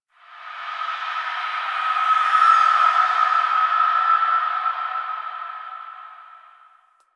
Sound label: Memory Echoes